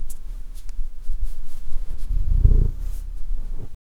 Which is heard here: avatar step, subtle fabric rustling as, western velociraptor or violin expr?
subtle fabric rustling as